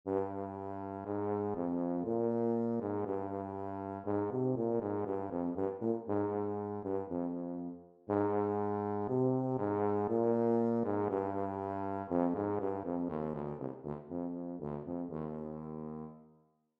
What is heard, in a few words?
tuba etude